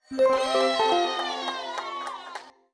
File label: game complete effect